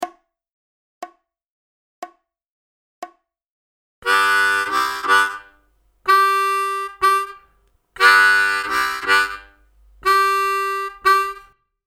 Solo Rhythm Harmonica Example

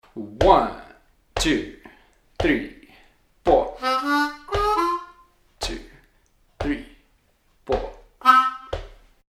Lick, harmonica

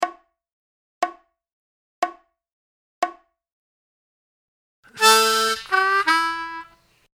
Lick Slow, harmonica

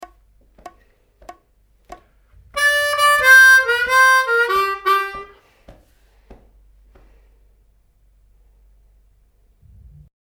Fun Shuffle Licks Lick, harmonica

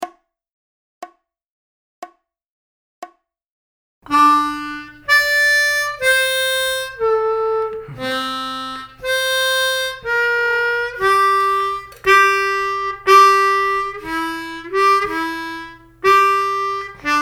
V IV I Licks 60BPM, harmonica

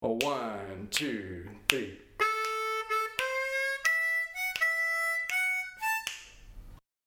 Jam Lick, harmonica

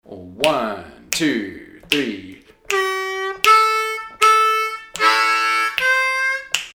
Lick, harmonica

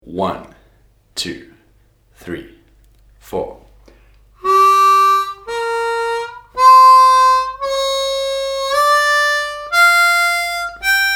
Blues Scale in 2nd position, harmonica

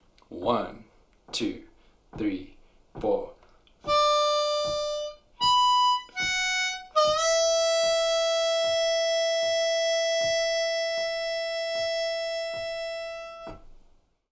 Slow Lick, harmonica

